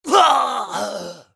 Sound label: scav death; eft